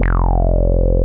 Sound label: JUNO FUNK F2; Drums In Your FACE!